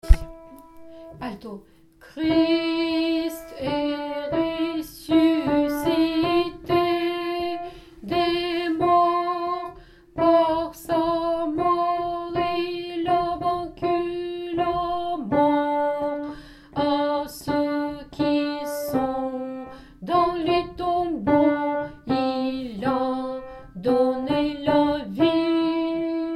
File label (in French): Tropaire pascal solennel Alto